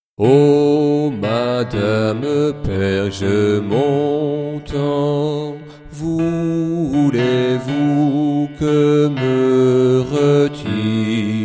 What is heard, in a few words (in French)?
Bas madame-basse